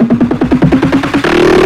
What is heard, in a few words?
145 BPM drum fill; Psytrance; Drum Fills; Singomakers; Infected Psytrance